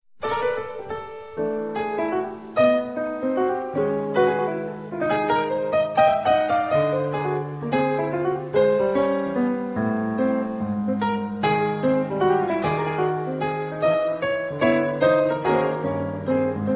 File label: pulse